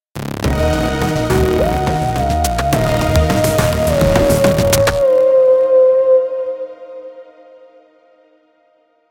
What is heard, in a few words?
Fight Low Heal; Remember Me